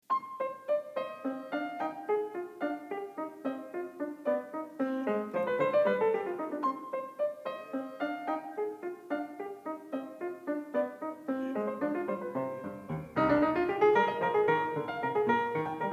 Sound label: Rondeaux; Partita 2 in c; BWV826; Bach; Partitas